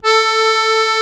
AKK VIOLIN, akkordeon

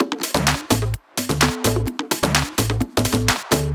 Drum Loop 128BPM FULL ohne BD

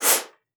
PUSTE, bassoon; Xsample VOL-6

